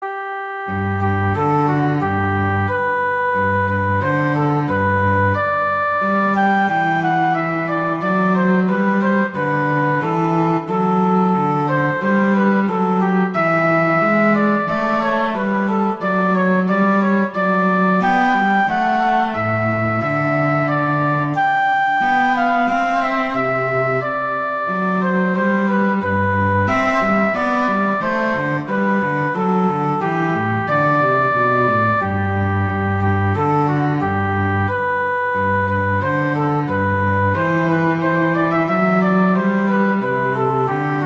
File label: Duet; Mozart; Duets